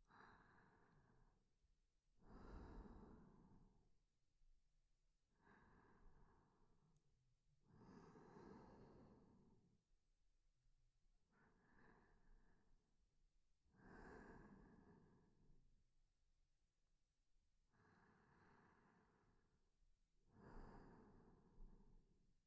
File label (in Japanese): 寝息（右側）; ASMR